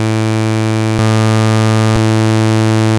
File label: sawtooth ee aa oo